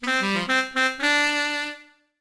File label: Saxtone